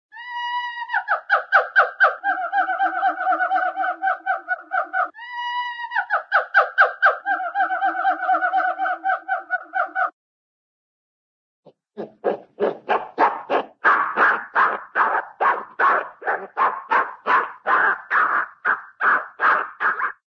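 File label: ape siamang hoots and calls, animal; cartoon; siamung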